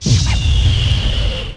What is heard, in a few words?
air hit; DAoC